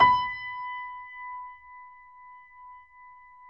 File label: B 4, Keyboards Acoustic, GRANDP ST; USB Soundscan vol.17